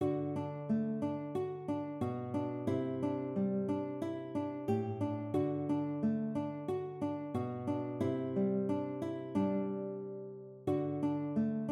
Silent Water

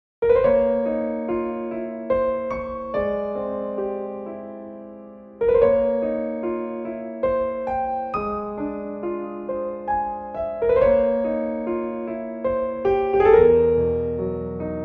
Piano Solo

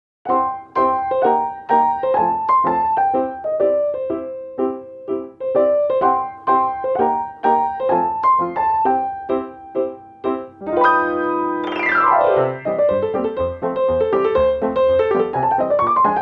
The Cats Rag Piano Original Version

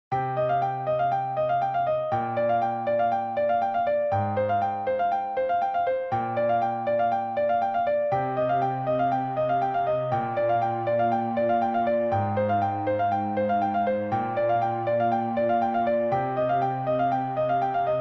Loop One

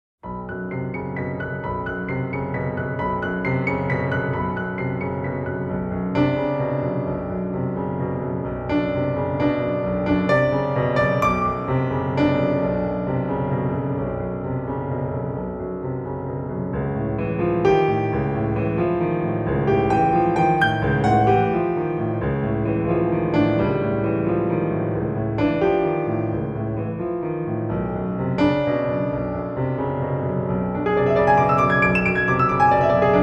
Piano Solo

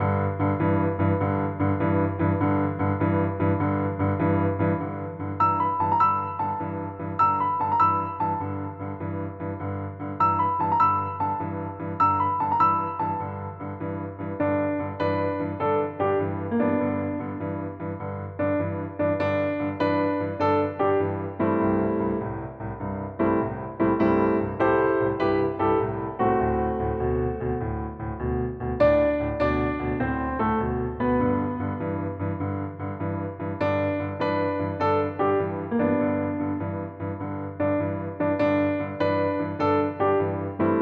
Jingle Bells, piano solo

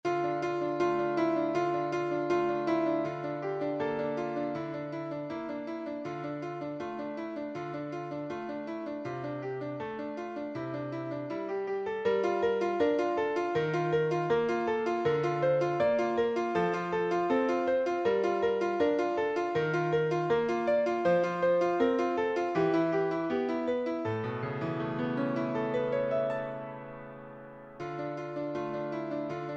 Piano Solo; Netmusica

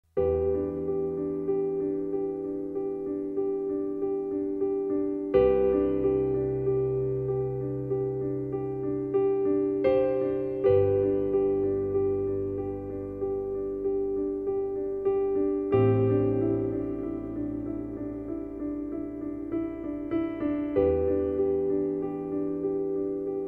Neoclassical Music, Klavier solo

